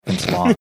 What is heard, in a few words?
snorting chuckle